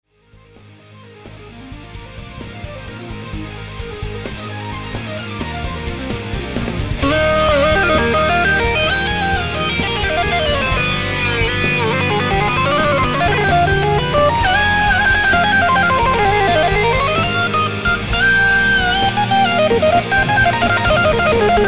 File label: keyboard solo